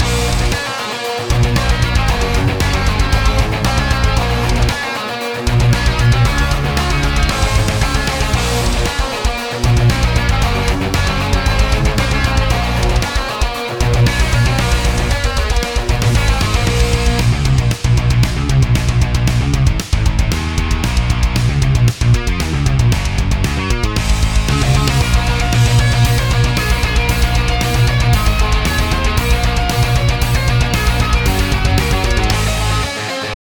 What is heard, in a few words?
boss battle metal